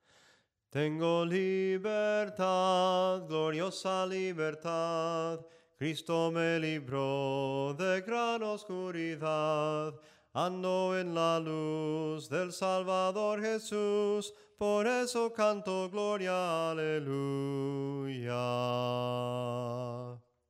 SOPRANO CANTADO, himnos en melodia soprano

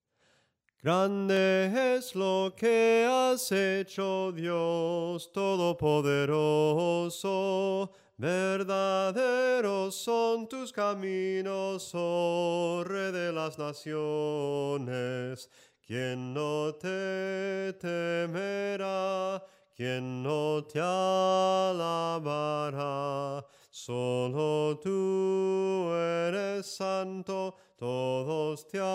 SOPRANO CANTADO

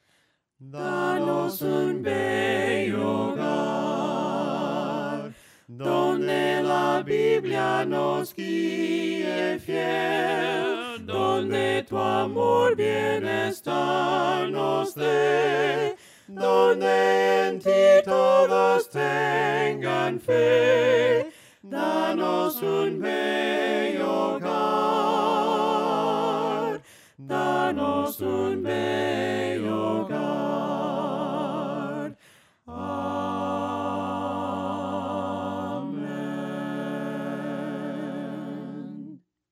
VOCES, himnos a capella; cantos del camino

